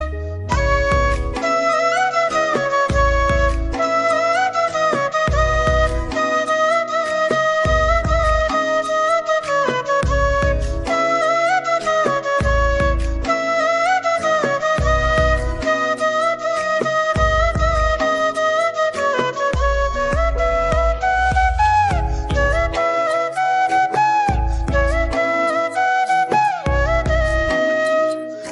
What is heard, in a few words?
Flute Ringtone